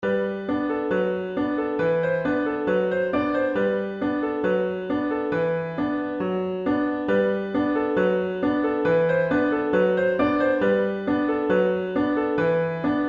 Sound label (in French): Ballade